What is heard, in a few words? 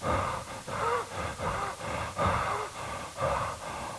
breathe